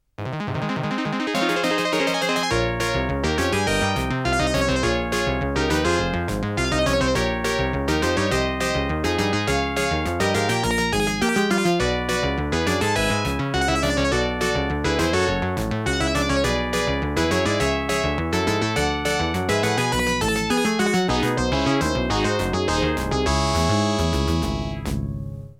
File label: All Clear; Capcom Game Music Volume 1